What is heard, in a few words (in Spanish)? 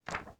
Movimiento Hoja